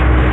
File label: AMY WATER; Sonic R; Windows